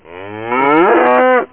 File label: Moo